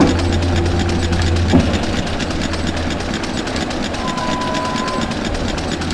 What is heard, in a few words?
pumpjack; ambient map mountain; World of Tanks Blitz